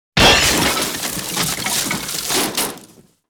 Crash

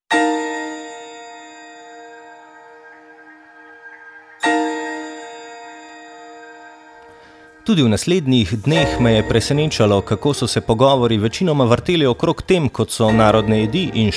JINGLE; TU PA TAM

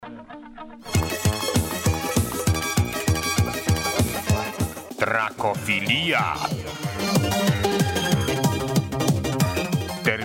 JINGLE; TRAKOFILIJA

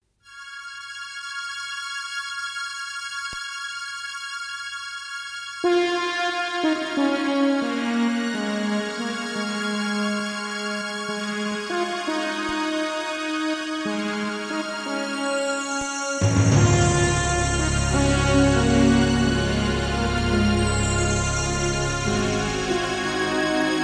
FANFARE; RS AVIZZO

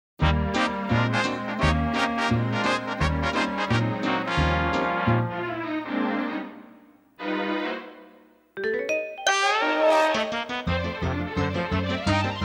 Comedy Music Intro

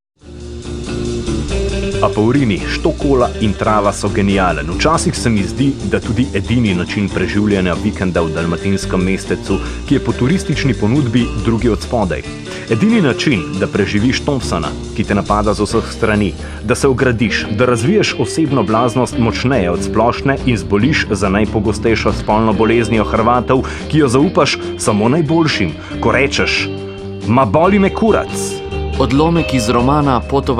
jingle

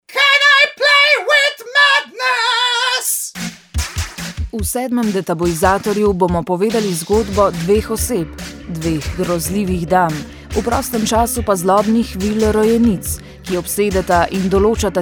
JINGLE; DETABUIZATOR

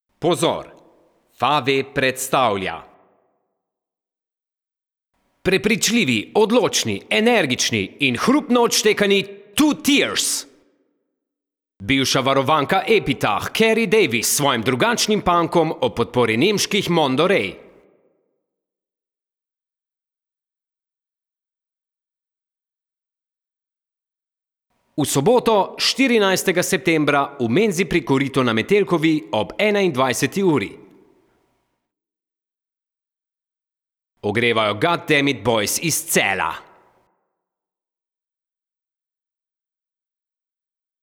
jingle vokal

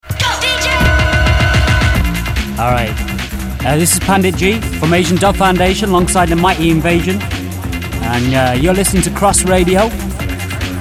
Asian Dub

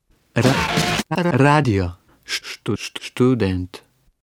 RADIO STUDENT JINGLE

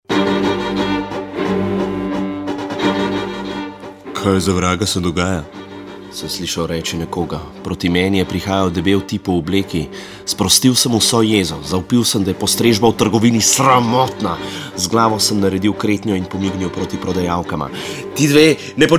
JINGLE; TU PATAM

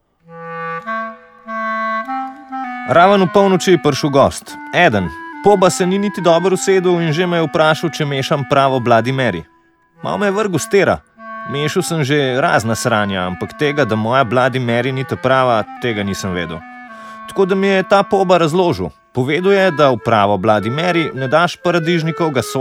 JINGLE; TU PA TAM

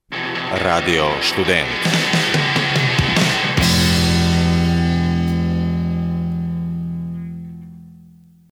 RADIO STUDENT JINGLE

